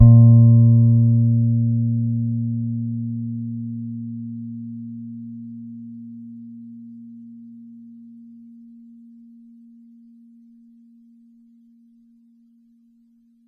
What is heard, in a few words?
A#3 soft, Pedulla Thunderbass, Bass; Giga Samples Collection